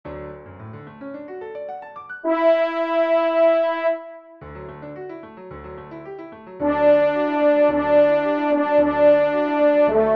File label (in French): trompe; Introit; Messe d'Estival